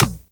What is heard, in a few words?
Beach Ball Kick; Warframe; Water Fight; Beach Ball Floof